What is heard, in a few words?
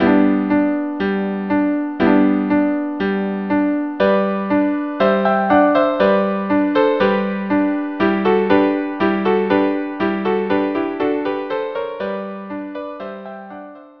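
violin viola cello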